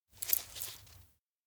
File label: nose pat collar; jet; anemoi パッケージ版 1.1